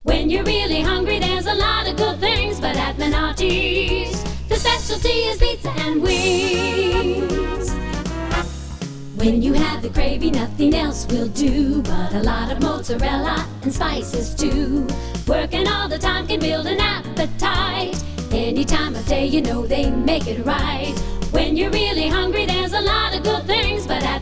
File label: Restaurant Jingles